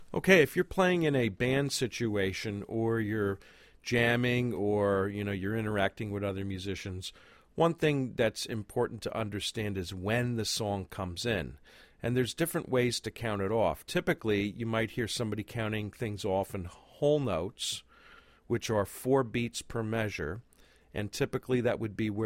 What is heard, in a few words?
Whole Note Quarter Note Countoff